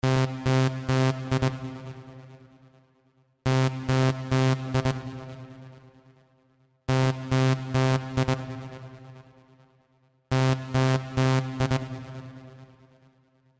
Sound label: Simple Ringtone